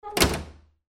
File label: Car Close Hood